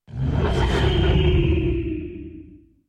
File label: Call of the Wild Hawk; Beastmaster